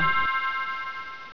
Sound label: Good Registration; VersaTile Pro v1.2